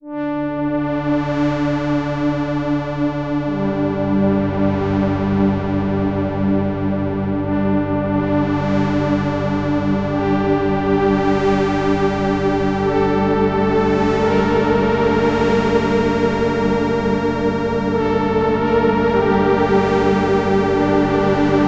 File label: layer pad; Lush-101